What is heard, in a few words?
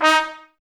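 BRS trumpets D#; 90 s Sample CDs; Miroslav Vitous; Woodwind Brass Ensemble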